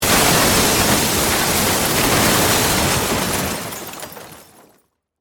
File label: Crash Roll Large, Crash, Roll, Large, Object, Heavy; Rocks; Materials